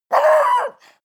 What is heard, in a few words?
bark loud, hunde; natur-tiere